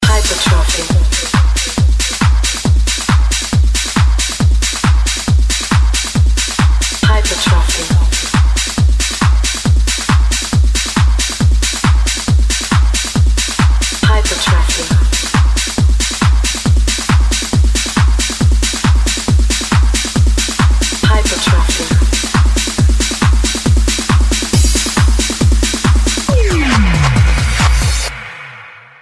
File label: Tecno